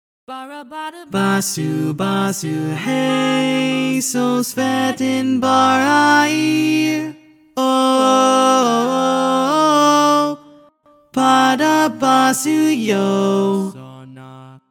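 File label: SATB baritone, barbershop tags; Each recording below is part predominant - one part louder, other parts quieter.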